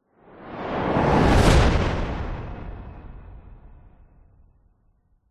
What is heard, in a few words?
science fiction spacecraft powerful flyby whizz past fast; zapsplat